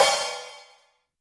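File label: Hi-Hat Open H; YAMAHA RM1X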